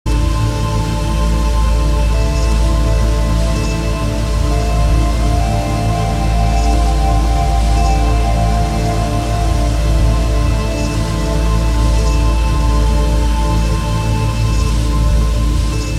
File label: pop ambient